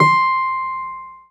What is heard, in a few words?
BD C5; Keyboards; Ableton Foundation FreePacks; Model-C